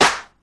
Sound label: 00s natural hip hop acoustic snare sample f key